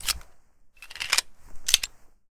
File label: PPK Unload; zps; Weapons; Firearms